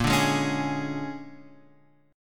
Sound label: A sharp 7th Sharp 9th, Guitar, Open F; A#7#9 strummed; JGuitar